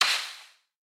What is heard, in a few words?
Disc Rico Tree; boomerang fu